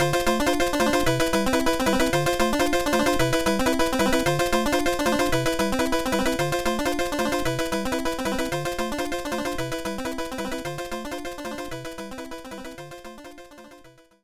BGM Star Hurry; Super Mario Maker

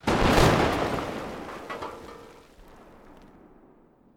tundra stomp sound; Arc of Templar

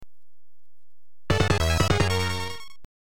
level 3 - completed, soundtrack; level 1 - completed; Turrican; Amiga

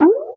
Candypet Sheep Bouncing; candy crush saga gamerip

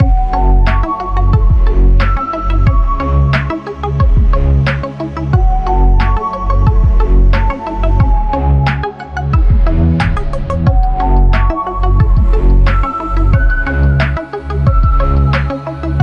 Lose; forza street

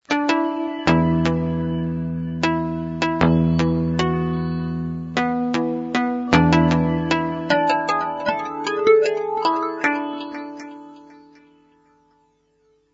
Music Theme

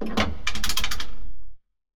Payphone Dial

